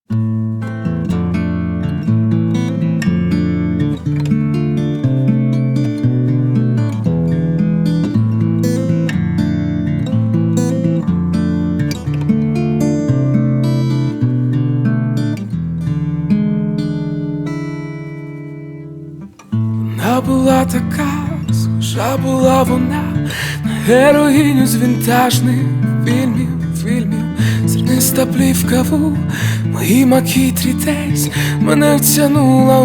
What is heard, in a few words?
acoustic version